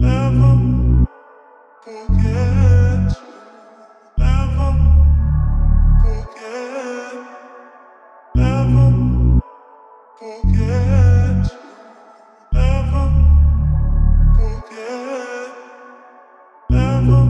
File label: 115 BPM F# Min, Melody Loops; Never Forget; Cymatics; Orchid Sample Pack